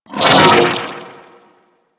Vocal Pain; Amalgam Override; Warframe

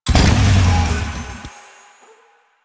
Boost, Motorcycle; Motorcycle Ninety Nine

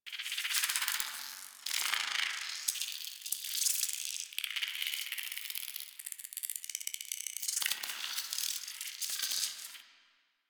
Landscape Insect Loop; Warframe; Ambience; Venus Open World; Positional Stereo Loops

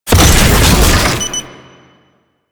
Tank drone deploy; Enemies; Abilities; Lasrian; Warframe

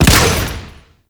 Chem Strike Nox Rifle Shot 3D

